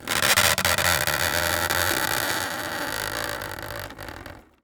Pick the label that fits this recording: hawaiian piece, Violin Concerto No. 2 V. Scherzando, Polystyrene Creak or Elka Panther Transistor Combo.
Polystyrene Creak